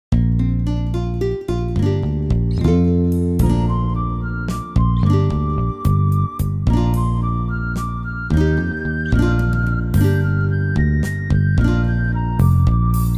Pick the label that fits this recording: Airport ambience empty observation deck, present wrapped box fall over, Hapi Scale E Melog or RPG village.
RPG village